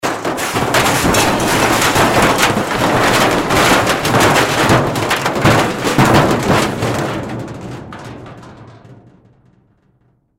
Crash Metal Smash Debris Large Pieces; Crash Metal Smash Debris Large Pieces Long; Blastwave

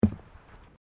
Footsteps Boots Bridge Wooden Step; Footstep Foley; Footsteps (Sound Effects:Human)

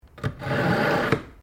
KNIFE SHARPENER USE; Various knives; Kitchen (Sound Effects:Household)

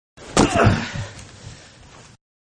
Football Tackle One on one with Grunt; Sports; Manhattan Production Music

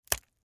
Wire Cut Red Bomb Squad; Disarm Bomb; Emergency; Miscellaneous Combat Sound Effects

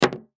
Mousse Can Drop; Drop Sound Effects; FOLEY

